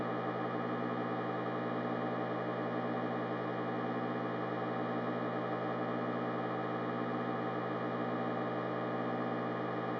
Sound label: machine